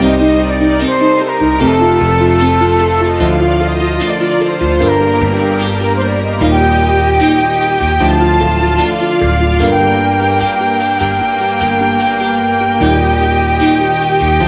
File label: dramatic guitar comp; by burak sound bank; dramatic dram vol 15